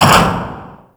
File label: Obstacle Course Pillar Rise Lock; Warframe; Clan Dojo